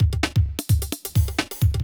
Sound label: electro kit 130